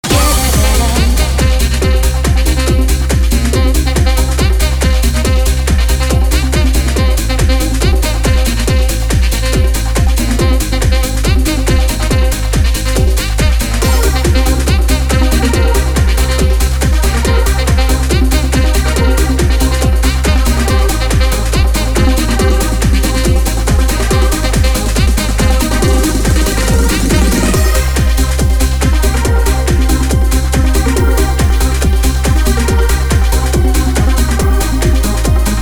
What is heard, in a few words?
Funky beat